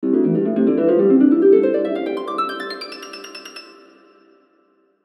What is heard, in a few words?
Musical Harp Ascend Reverb; Strings; Blastwave